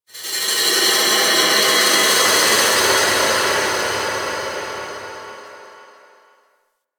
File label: Moon Texture Small, Ambience; Warframe; Orokin